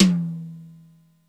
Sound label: TOM H, DRUMS; Crypton - DrumBase5000